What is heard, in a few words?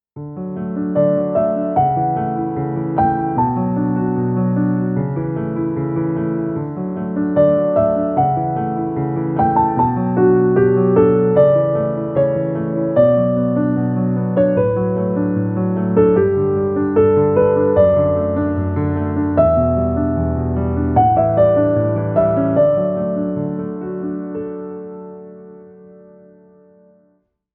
Piano Intro